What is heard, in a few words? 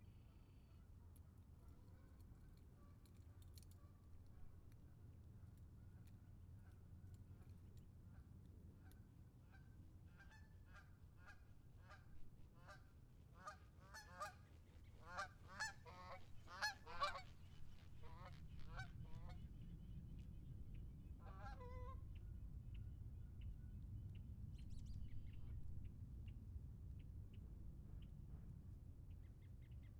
Low flyover by five Canadian Geese